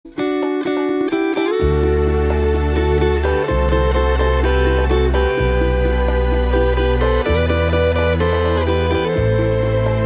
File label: bachata